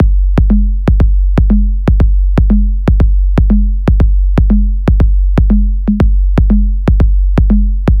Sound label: loop swing; LANDR